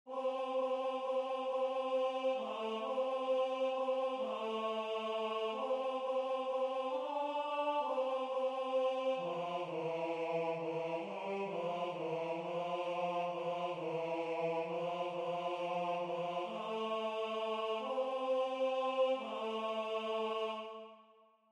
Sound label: Tenor